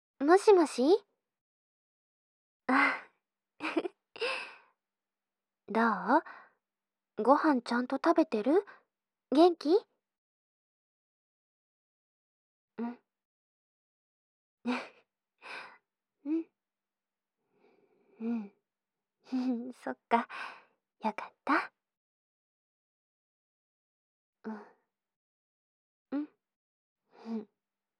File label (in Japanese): 電話効果あり 耳舐め; 耳舐めで私のおまんこを感じて？ 中出しおま◯こエッチ。; SE無し